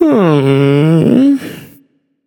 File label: sigh, voicepack; smite; jorm